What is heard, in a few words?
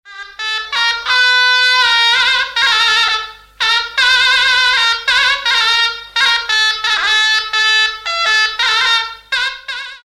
aerophone